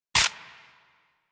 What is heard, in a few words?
Akimbo Pistol Mech; Grineer